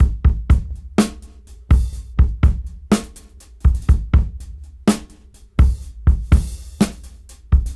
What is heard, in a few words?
Funk 61 BPM; AMG; Keith LeBlanc Phat Butt Jams